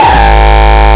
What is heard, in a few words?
125 g sharp, kick